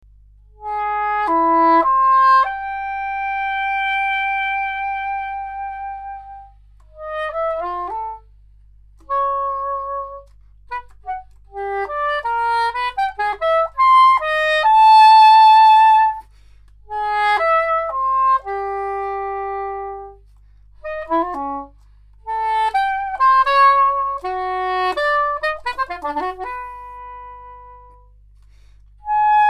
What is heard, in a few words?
soprano saxophone